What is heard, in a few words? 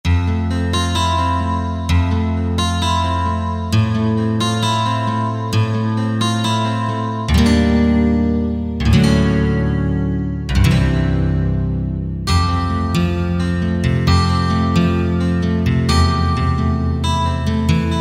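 Legato Acoustic Guitar, Alesis QS8; Factory Preset examples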